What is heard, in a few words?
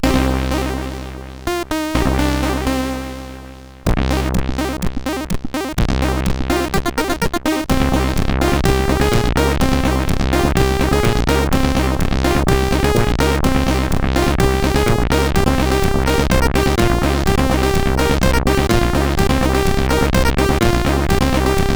intro, CSG8580R5; Magia 2; hvsc